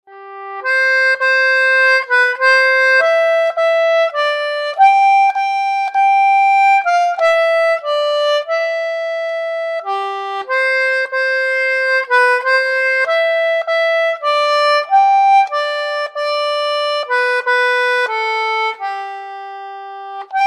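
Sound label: hark herald solo concertina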